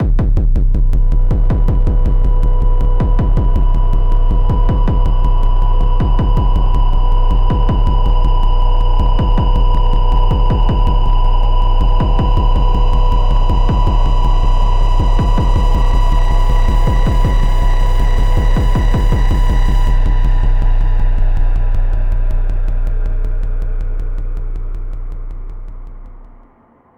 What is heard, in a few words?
Ear Plug Amb, gamerip; dying light the following